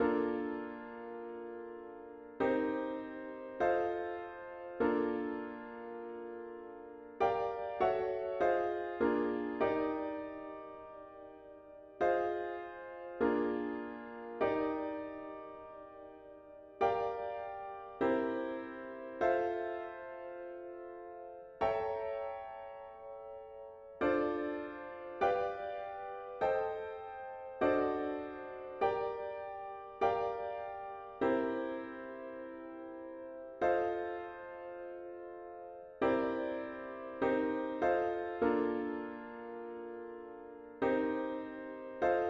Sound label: chords only